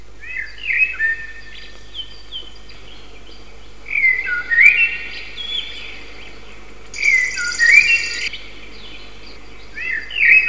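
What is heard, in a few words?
common blackbird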